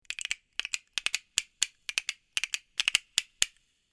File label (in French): son castagnet; Percu